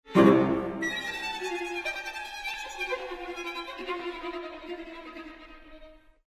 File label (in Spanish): cuarteto de cuerda; Witold Lutosławski